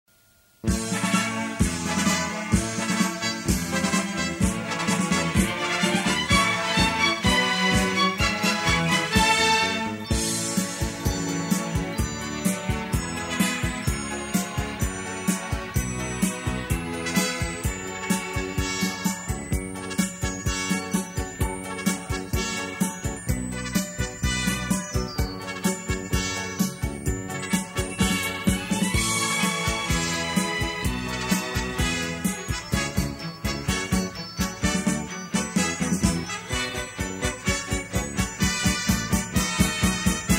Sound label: game Bgm; jiandangbainian